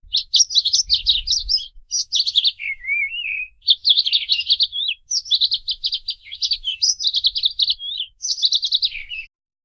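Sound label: single bird singing softly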